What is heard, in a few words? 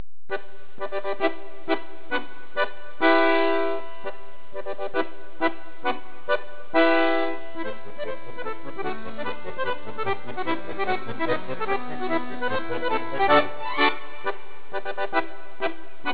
march, accordions